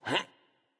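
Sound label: grunt lift, male; smite; voicepack; rama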